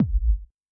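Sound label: bass drum, Kick, Yamaha DTXpress-II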